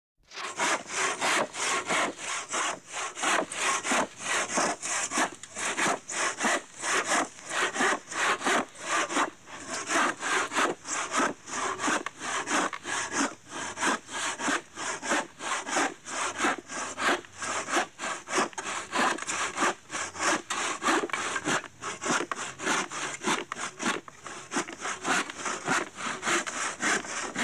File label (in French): scie